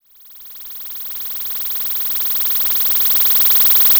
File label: NRG SFX, Trance; Sonic Foundry (Sony Creative Software); Trance NRG VOL-1